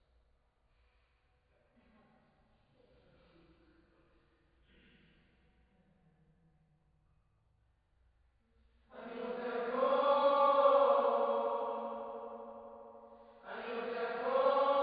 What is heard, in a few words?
Choir